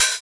mellow foot hi hat sound g key; tuned drums

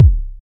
tight low end bass drum d key; kick

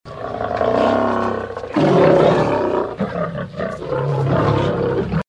LION ROARING

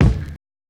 bumpin kickdrum d key

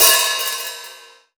huge open hat sample c key

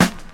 warm sounding acoustic snare sample F sharp key

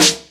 sparkle steel snare drum a sharp key

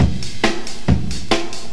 139 bpm drum groove g key; drum loop

